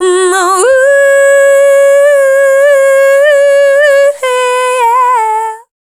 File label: Dance Vocals, FEMALE